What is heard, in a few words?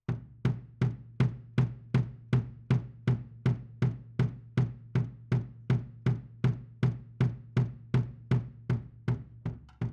Kit, 160 BPM